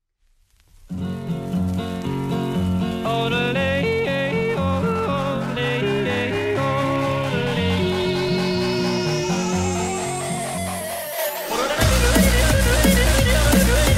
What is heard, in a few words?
yodel darkstep ringtone